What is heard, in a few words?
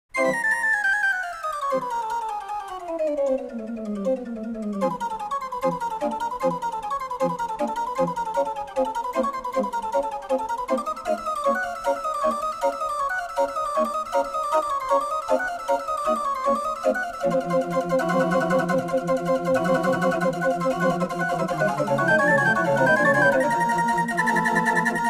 Odin orgel, Le vol de bourdon